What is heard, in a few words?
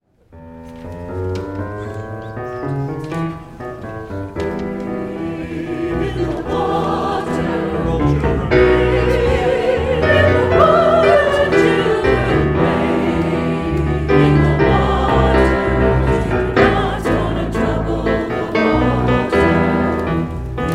refrain and verse